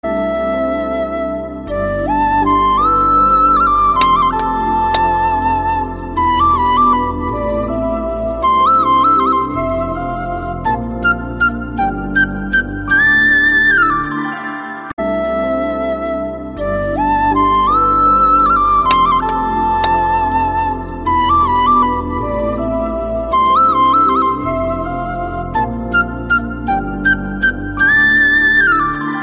flute; maheshinte prethikaaram; idukki

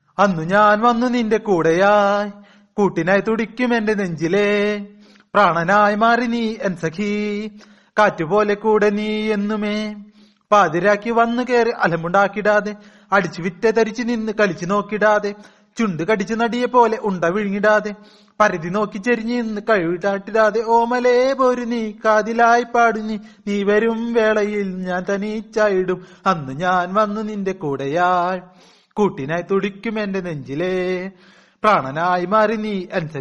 fast rap

